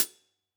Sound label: close Cl Hat, drum